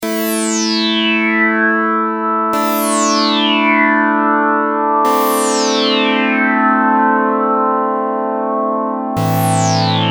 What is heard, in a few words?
JP8 Fat Filter Sweep Pad